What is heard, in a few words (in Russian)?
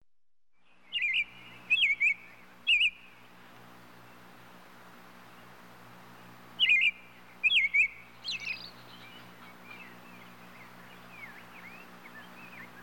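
малиновка пение птицы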